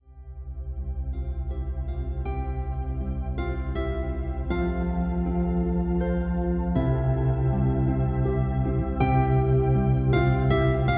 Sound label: Reiki Infusions Pain Soothing